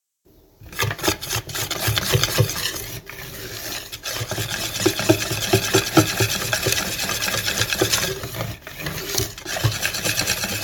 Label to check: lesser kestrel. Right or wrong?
Wrong